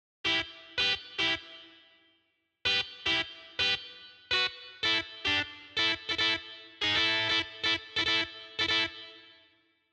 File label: Guitar